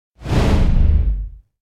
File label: Whoosh Harsh Hit Impact; Hollywood Edge; sound designer's toolkit v2